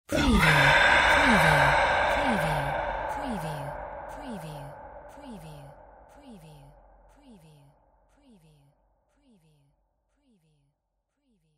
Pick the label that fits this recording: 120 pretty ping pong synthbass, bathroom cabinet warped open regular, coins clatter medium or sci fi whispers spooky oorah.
sci fi whispers spooky oorah